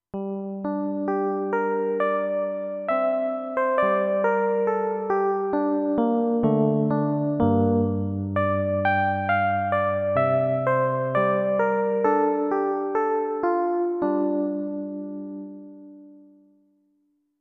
XG Synth Mallet

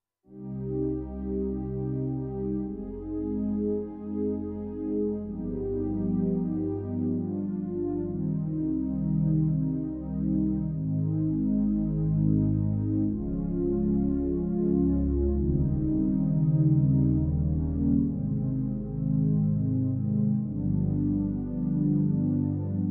Warm Pad

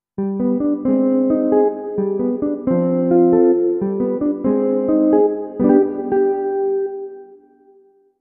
Jazz Amp

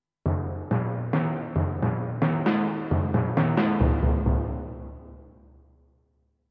Timpani

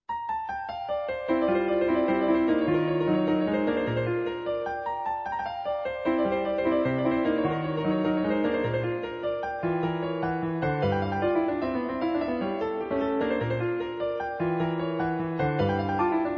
Piano folk

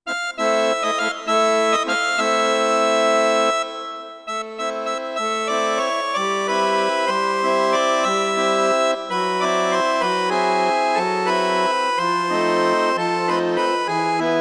Tango Accord

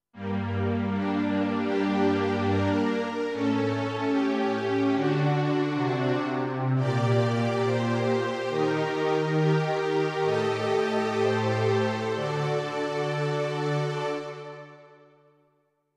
Syn Strings

